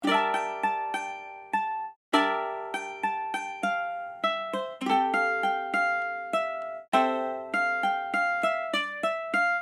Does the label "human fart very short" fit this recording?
No